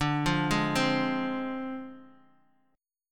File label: D Minor 7th Flat 5th Bass Standard; Dm7b5; arpeggiated